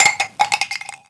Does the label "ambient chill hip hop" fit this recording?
No